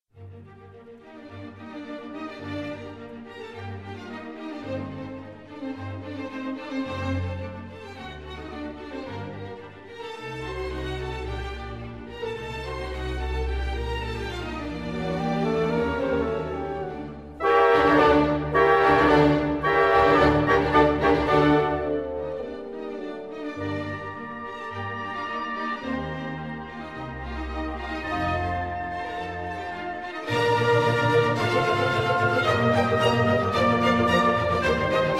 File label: Symphony in G Minor, No.40, 1st movement